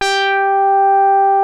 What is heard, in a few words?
FAT BASS, BASS STATION; Syntec; Wall of Sounds